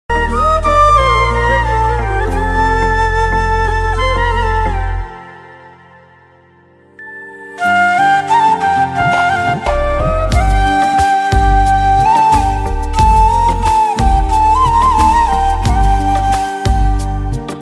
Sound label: Flute Ringtone